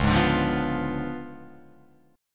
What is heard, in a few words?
C sharp 7th Flat 9th, Guitar, Open F, C#7b9 chord; strummed; JGuitar